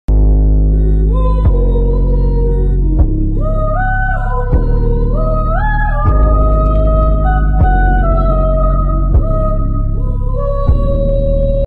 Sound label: Ascending; SpongeBob